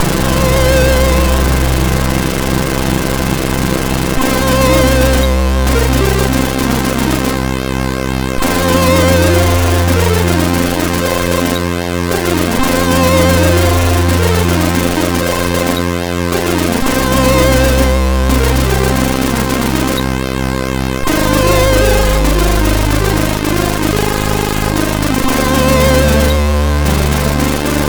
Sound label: E-Load loader, MOS6581R4; hvsc